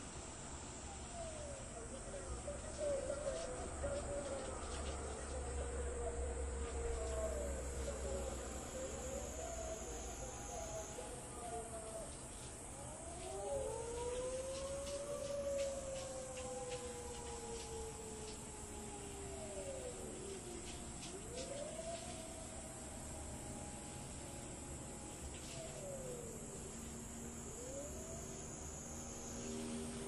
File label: bath siren kyukyu